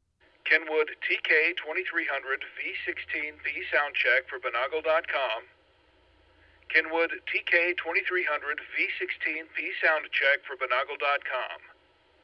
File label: kenwood tk 2300v16p, radio samples